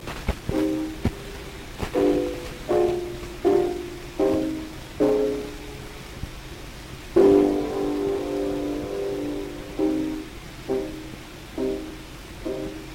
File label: Gramophone